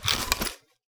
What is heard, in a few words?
board holster; hammer; melee; tfa starwars melee weapons pack